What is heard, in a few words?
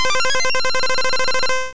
Hyper Beam; sfx; attack moves; pokemon; gen 1; rby